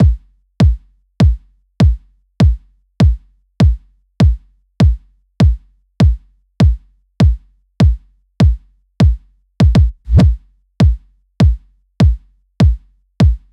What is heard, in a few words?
loop kick 100; Seismic Loops